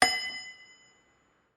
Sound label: Messenger Ding; GPSMAP H1